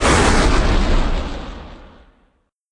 fireball fire

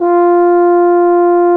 tuba loopnote

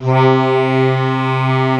tuba loopnote

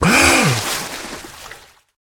gasp

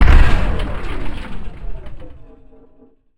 spiderbot die

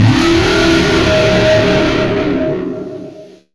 falling, player

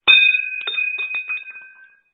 fuel casing

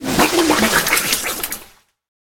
gasp

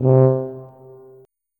tuba note

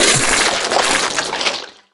gib

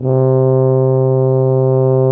tuba loopnote

